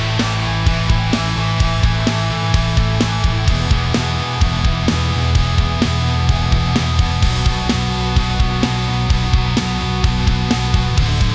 energetic rock; ai

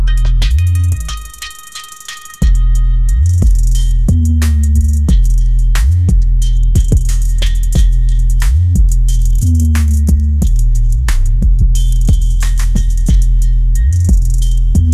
hip hop beat, ringtones

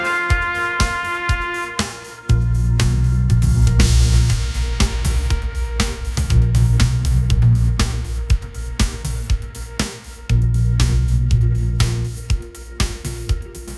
revelations trumpet blast pop, ringtones

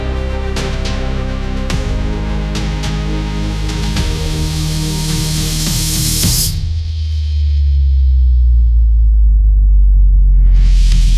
epic orchestral trailer

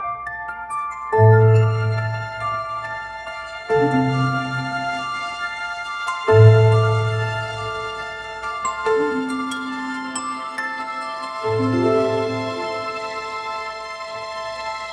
orchestral strings; ai

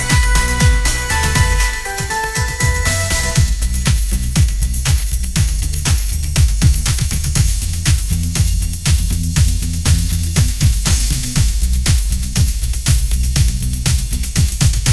upbeat pop melody

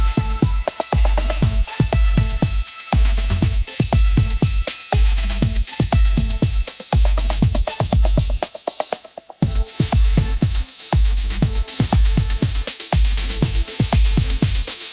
bright arabian pop groove, ringtones

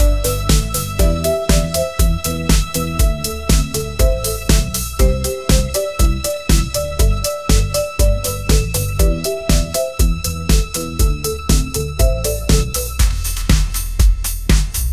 upbeat pop melody; ai

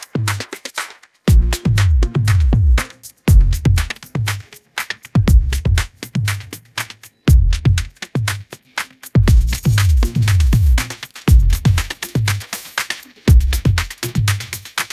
soaring pop flute groove; ai-generated

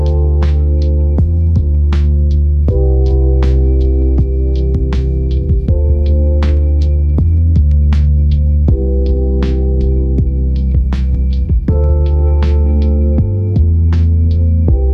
lo fi hip hop

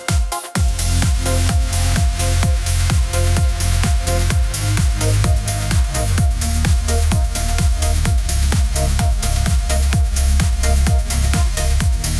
electronic dance music, ringtones

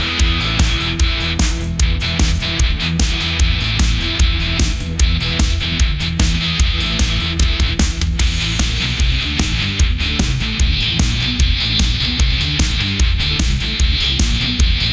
energetic rock

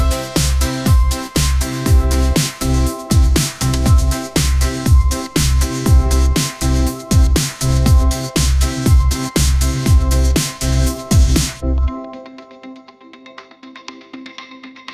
upbeat pop melody, ringtones; ai-generated

